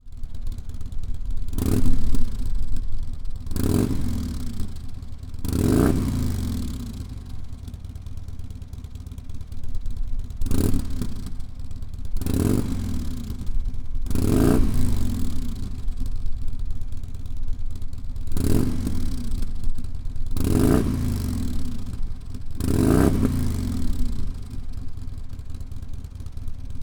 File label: Touring 103 STOCK Rev, harley touring; rinehart-4-slip-on-mufflers-for-harley-touring